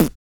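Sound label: vox percussion, Vocal Planet Jazz FX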